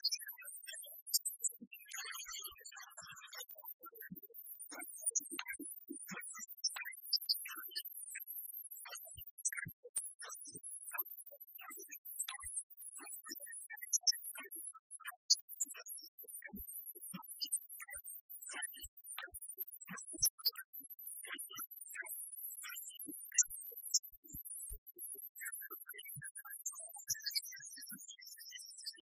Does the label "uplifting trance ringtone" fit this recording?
Yes